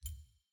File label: button hover; gameselect